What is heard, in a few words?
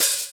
Open Hhat, Hihat; Equipped Music; Loungin House